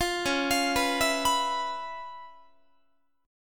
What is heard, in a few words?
C sharp 7th Sharp 9th Guitar, chord; C#7#9; arpeggiated; tuning=4A,4E,5A,5D,5G#,6C#